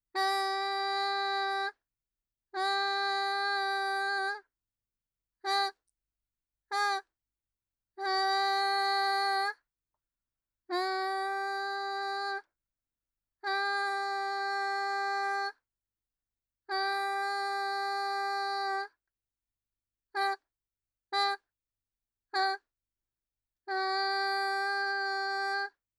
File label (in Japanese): あーーーーーーー